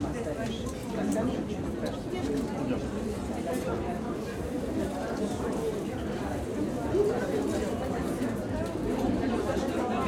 crowd, concert hall lobby, stand-up party, people talking and toasting, XY stereo; Alexander Kopeikin; Moscow Ambience